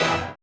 hit, Roland MC-909

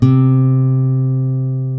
C2 FLAMENCO, GTR; WorldSounds

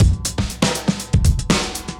breaks, 120bpm; free bespoke breaks; BeatStyleC

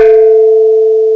STEEL LOOP, MALLETS; CMI Sound Library Rev 1.3

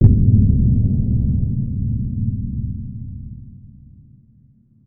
Impact; impact samples

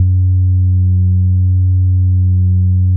F#3, Bass; E-MU Producer Series Vol. 6 – Analogia Project; Boom da Bass

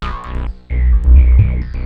T WAVE, Synth Waves, Techno Trance

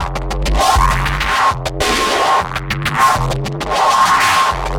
Noise Beat; Drums & Drones

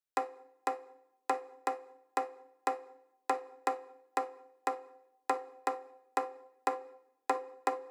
Conga 120bpm, Nu Disco; Zero-G; Construction Kits; Legacy

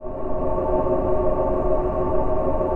PAD, DIGI-PAD

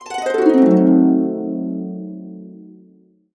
C DIM7, Harps; USB Soundscan vol.62 - Complete Harps; AKAI

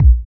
Kick, Kickdrum; Equipped Music; Loungin House

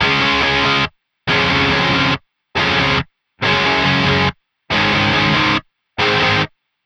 Distorted Guitar 140BPM C#maj; Distorted Guitars; Vengeance - Essential Clubsounds Vol.4; Guitarloops

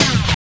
RAVE STOP; Spectrasonic Distorted Reality 1; RAVELAND RYE

